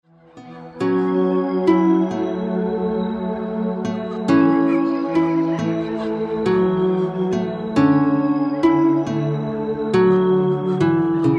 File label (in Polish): muzyka bez oplat